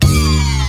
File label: Bonus Wheel Symbol; JackpotDarts; ClubFusion